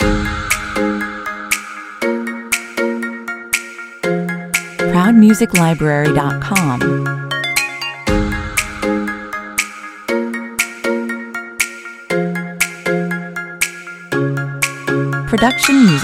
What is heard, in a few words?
tropical summer loop